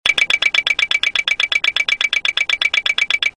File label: Clacker, 737